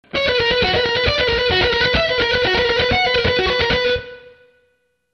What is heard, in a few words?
Lick